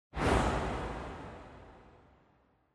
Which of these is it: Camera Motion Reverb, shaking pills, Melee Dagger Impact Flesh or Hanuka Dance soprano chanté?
Camera Motion Reverb